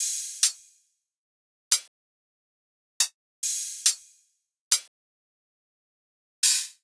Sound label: Riddim Drum Loop 140 Top